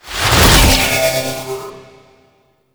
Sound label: Mech Focus Beam; Vehicles; Abilities; Thano Mech; Warframe; Nechro Mech